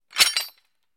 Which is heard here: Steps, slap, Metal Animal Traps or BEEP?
Metal Animal Traps